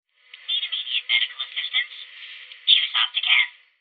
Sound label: alarm; ODST